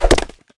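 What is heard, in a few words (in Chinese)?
chop, 挥动斧头砍树音效; 格雷格; 绿野世界; 卡通农场; 资源型近战单位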